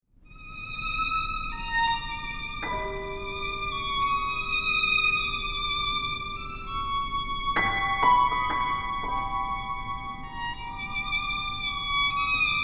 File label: vln sonata; LIPTAK; ICE